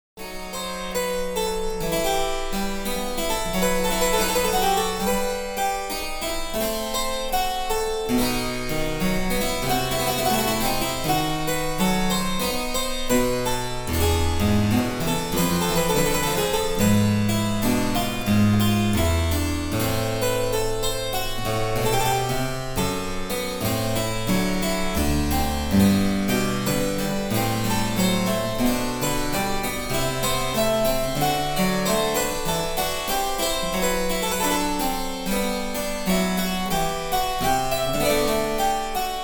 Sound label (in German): Cembalo; Mark Lindley WTC 1